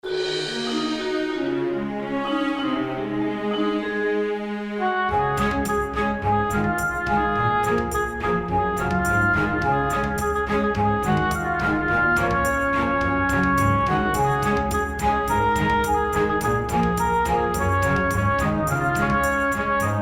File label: Orchestral Remix